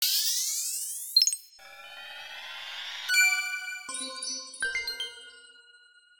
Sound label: Puzzle Completed; Juufuutei Raden's Guide for Pixel Museum; Memory Pixel